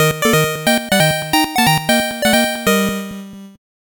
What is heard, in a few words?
8bit jingle slow